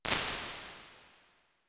Narrow Hall, impulse responses, medium spaces, halls; Apple